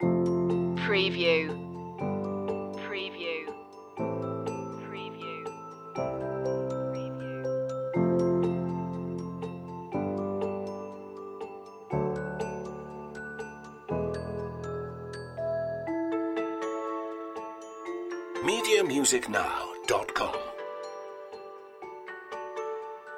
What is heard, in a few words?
bell shoppe loop